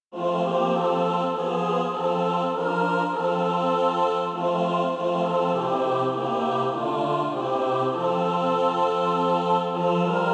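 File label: mixed, Practise Music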